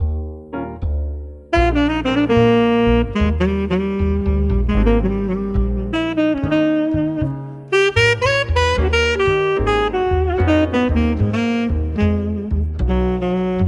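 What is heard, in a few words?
Klassicheskij dzhaz, classical jazz, Kafe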